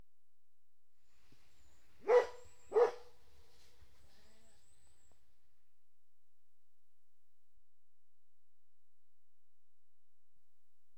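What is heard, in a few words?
One Dog Barking - Lots Of Birdsong; Horses & Dogs; BBC Sound Effects Library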